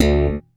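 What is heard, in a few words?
WARWICK THUMP D2, BASS; Masterbits CLIMAX 7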